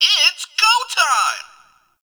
Atomicycle Game Bark; Ambience; Props; Warframe; Nineteen Ninety Nine

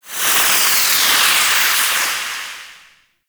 Stadium Hazard Spark Projectile; Gameplay; Warframe; Nineteen Ninety Nine

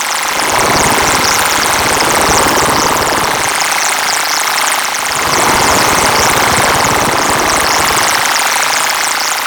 Pod Quad Shock Rifle Loop, Weapons; Warframe; Corpus

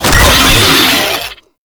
Player Spotted, Vocals; Warframe; Proto Infested; Brachiolyst

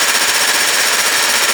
Fortress Turret Loop; Ambience; Gameplay; Warframe; Grineer

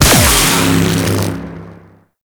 Shot, Arc Rifle; Coda Synapse; Infested; Weapons

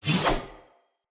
Dagger Whoosh; Weapons; Player; Swords; Fey

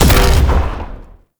Void Dragon BFG Beam Shot; Enemies; Abilities; Warframe; Duviri

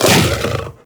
Kubrow Armored Vocal Attack Short; Warframe

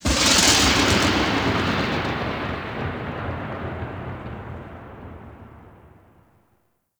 Void Storm Thunder Clap; Warframe

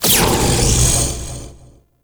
Shrine Assemble Frag Deposited; Warframe; Duviri Murmur

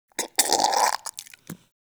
Goop Squeeze Close; Ambience; Warframe; Infested Eris

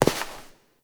Step; Warframe; Koumei

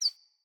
Cardinal Female Bird Single Chirp

